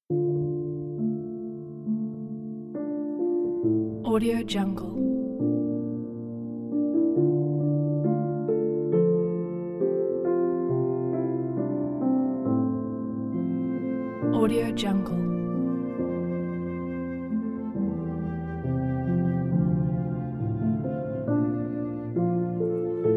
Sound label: memory of you piano